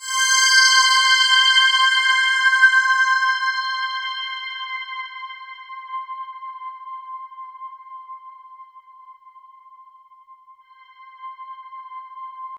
POLY SAW, Roland JV 2080, C5

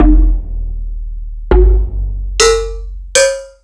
Multi FX

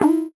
VocoBit Hit, 8 bit; musicradar; 8-bit bonanza

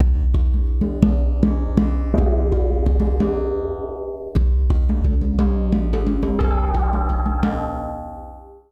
Djembe Electro; Sonic Foundry (Sony Creative Software); Ma Ja Le Ethereal Textures

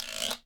GUIRO

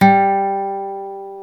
GTR Nylon, Roland; Club-50 - Foundations Roland; Nylon Martin

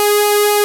CS PWM; INIS; Vintage

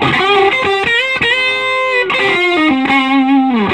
SOLO, Vintage Blues Guitar

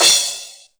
Crash, Yamaha DD-20

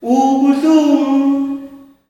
"OUU-PU", MALE; Spectrasonics; Heart of Africa 2; S.MALEAMB

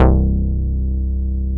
DANCE BASS; SYNBASS

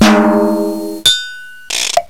ORCH PERC; OLD SYNTHS; Rubber Chicken Software Sample Bank

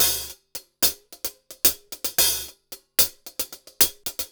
Top Ends, Beats; Zero-G; Monster Beats

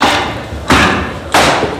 Tap feet 65bpm; Hip-Hop Street Beats Construction Kit; Street Beats by Poogie Bell

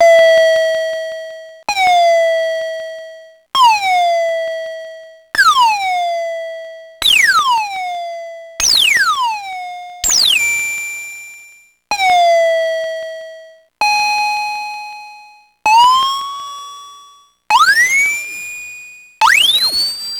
Electro-Harmonix Super-Spacedrum

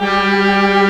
Organ, Barton Bold&Bras

